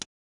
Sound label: m4a1 Detach silencer